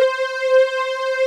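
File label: synth strings, JV 1080; Pro-Rec - Synthsonic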